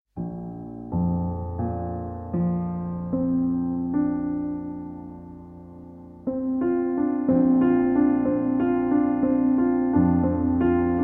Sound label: Relaxing Piano Music, Solo Piano; Arr. For Solo Piano